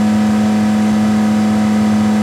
Veneno High

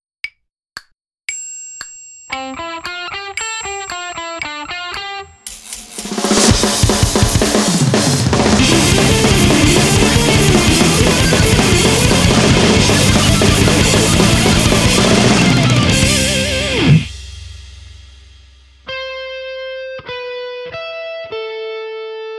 Guitar Practice

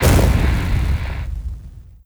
Fire Spell; Idle Champions of the Forgotten Realms

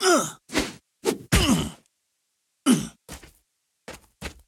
recoil; Puzzle Chronicles; PS3; PSP; X360; SFX 3D Heroloin Sprecoil

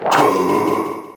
windup; Handegg

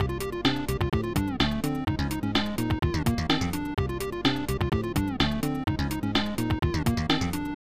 Failed Level; Super Morph 1993 SNES

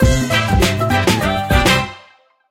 Music Outro; My Tamagotchi Forever; android; GSFX 2048 Music Outro

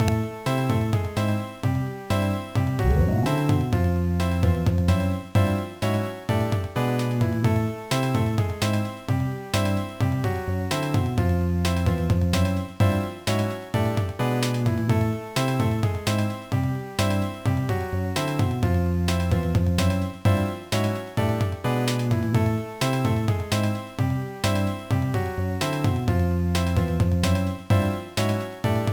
Instrumental

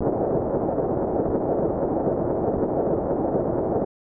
Rumble

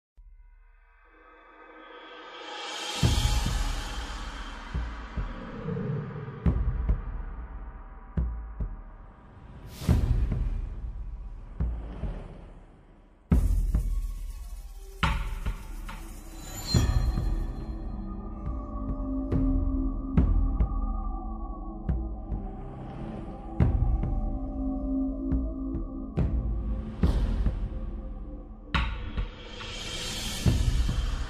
Magma Belt Area; Dragonica Online Dragon Saga

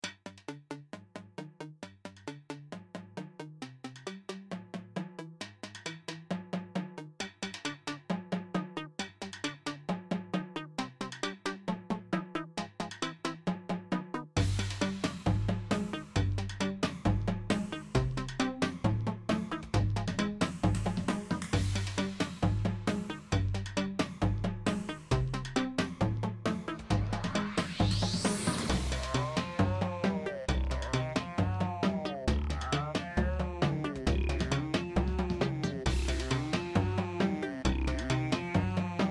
Ingame Music; Bucketz

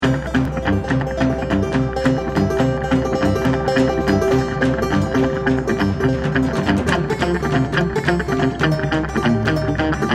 Aggro Agg loop no lead; Outdoors Old

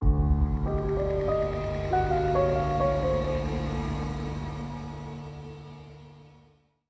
String

